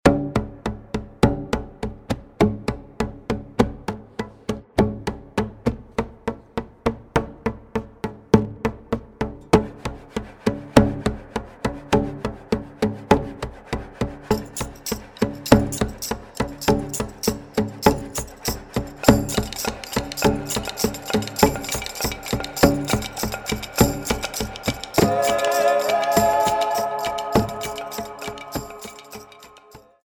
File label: Shamans Drum; AudioSoul